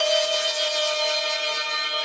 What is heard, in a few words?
synth main